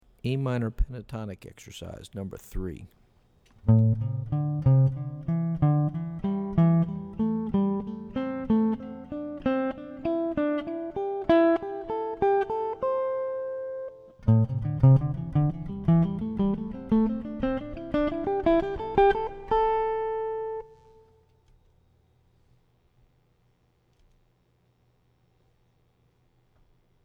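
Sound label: Am Pentatonic Exercise, guitar; lead skills materials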